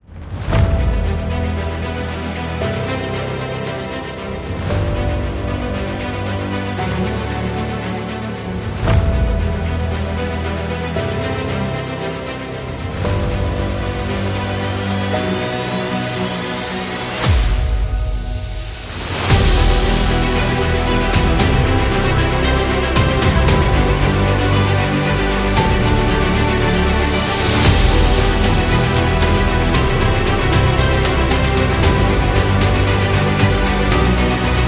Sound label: Fanfare